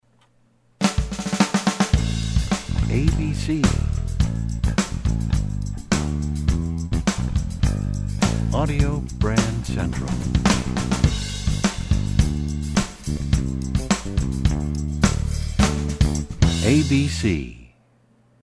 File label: American Country, radio jingles